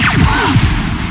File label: WOOD LSR; STARS FXS; East West; Global Sounds